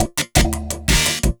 LOOP, Drum & Bass, 170 BPM

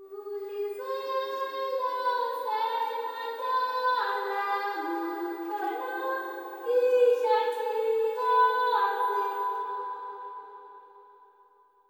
LTLE GIRL, AFR.GIRL; Spectrasonics; Heart of Africa 2

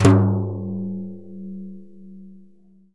TIMB BR LO F, Synclavier Percussion; Synclavier Percussion 2; World & Orchestral; ILIO

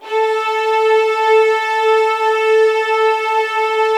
A4, soft violins; Q Up Arts; Denny Jaeger Master Violin Library

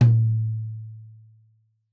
Tom2 Stereo, Deeply Sampled Drums; SMDrum

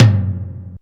drm, e3, EMU SP 12, DRUMCOMPUTER

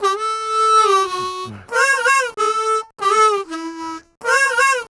Harm 098bpm A, Harmonica; Quazibeat - African Secret

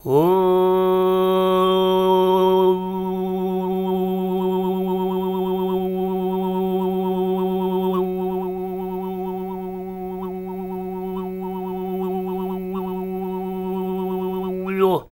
FREE, TUVAN MID; Spectrasonics Vocal Planet CD5; World Voices

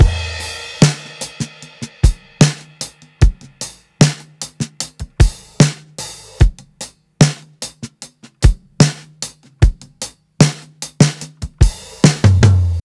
75 BEAT, drums; LOOPS; Basement drums vol 2

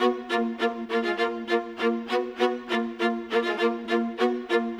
Viola, Orchestral; Sonic Foundry (Sony Creative Software); Orchestral VOL-2; Modern 06

